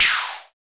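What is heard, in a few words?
FX synth; Sound & Vision - Gigapack II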